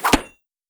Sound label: strikes; kakamora game